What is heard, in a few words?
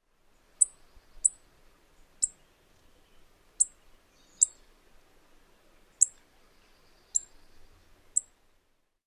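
chip calls, Nashville warbler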